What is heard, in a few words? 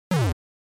NES Fighting Fall